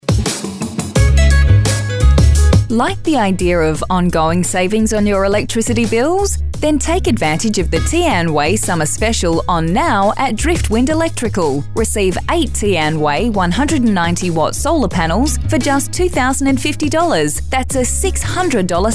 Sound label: on hold music